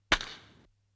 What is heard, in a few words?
Baseball Bat Wood Hi; baseball fx & games